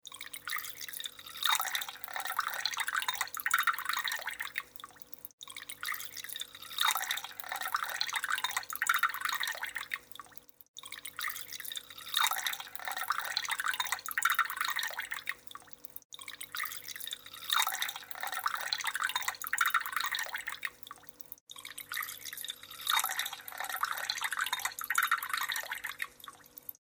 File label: Water All